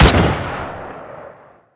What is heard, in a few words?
ak47 distant